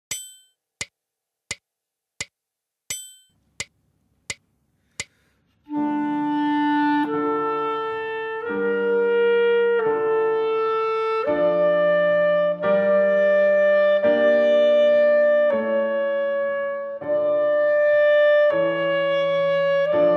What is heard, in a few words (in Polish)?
dyktando d-moll